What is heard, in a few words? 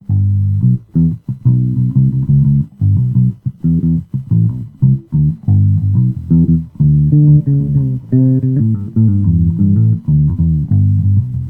Fretless, guitare